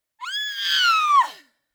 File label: scream